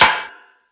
crisp snare drum sample a key, snare sample tuned to the A note; snare drum samples